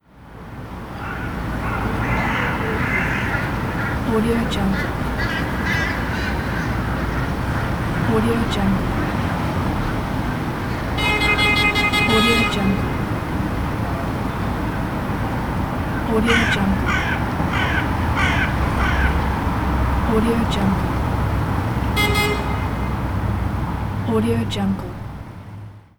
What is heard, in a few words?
crows in the city